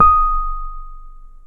Pianet D#7; Keyboards of The 60's and 70's; H.Pianet

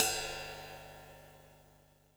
ride, Drums Acoustic; USB Soundscan vol.10; AKAI; 90s sample CD; DYNAKIT 1

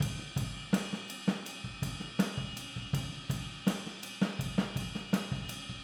Boogaloo Chorus Overhead ST, 82 BPM

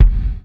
GATE BD, Drums Acoustic; USB Soundscan vol.10; AKAI; 90s sample CD; ALL BD

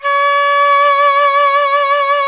BAN-DI FLUTE; Spectrasonics; Heart of Asia

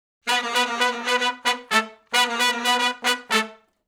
Funk Riff (A) unison, Horncraft for R&B; Sections; Crimson Blue and Fabulous Horncraft 4 RnB

